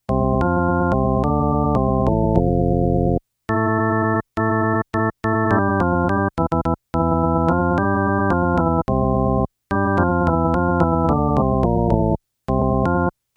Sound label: lively melody